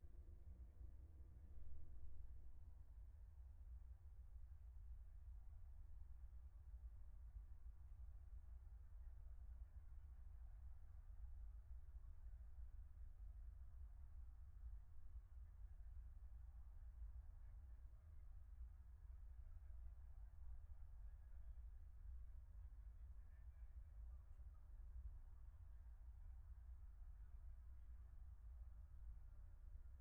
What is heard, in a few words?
airplane noise, Apple AirPods Max Airplane Noise Audio; active noise cancellation (ANC); One of the most popular ANC headphones, the Apple AirPods Max, exhibit this described delay when a new noise is introduced.